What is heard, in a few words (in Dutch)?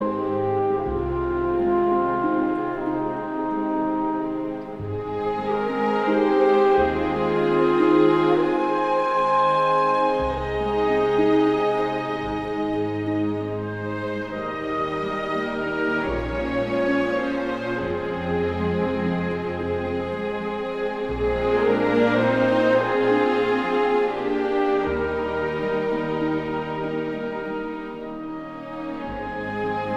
instrumentaal; Puccini; O mio babbino caro